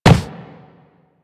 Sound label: Run Wood, Footsteps; Warframe; Operator